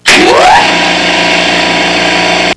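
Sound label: SUMP PUMP; HAUS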